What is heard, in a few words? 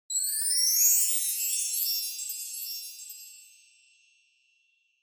Wind Chimes, Mid